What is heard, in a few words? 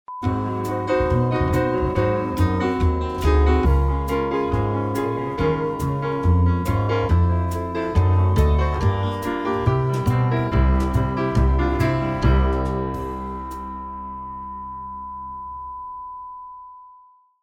groovy jingles